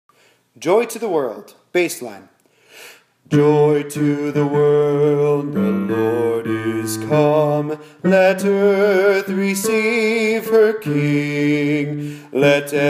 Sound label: bass joy to the world